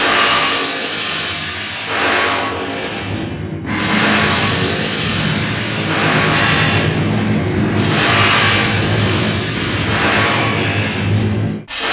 Gnashing Metal

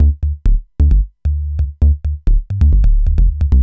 bass, dance

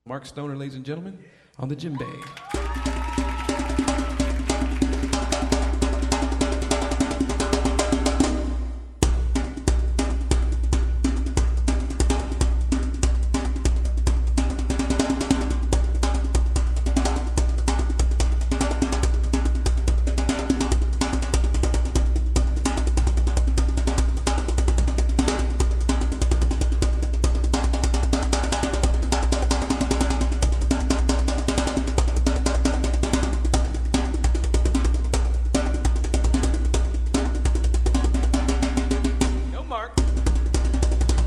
Percussion - Djembe Solo; Arabic; World Music

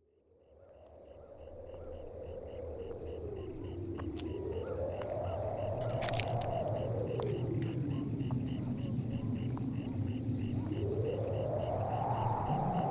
Little Girl Walking, Scary Sound Effects

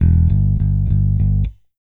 Classic Rock Bass

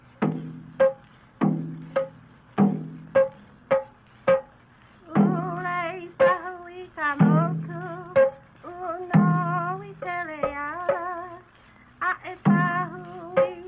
Name chant, Hawaiian; World Music; Name chant for Kalakaua

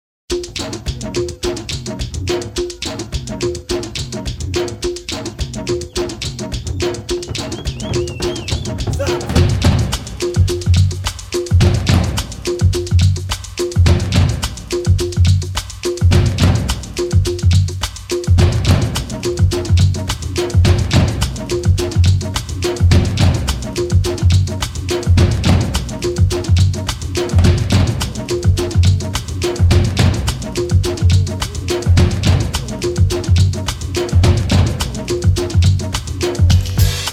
Rain Dance Drum Version, New Age

